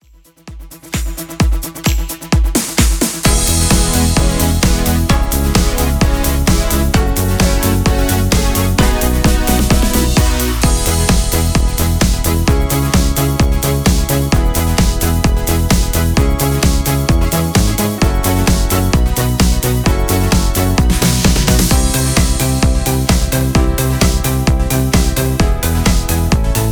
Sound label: Fox Disco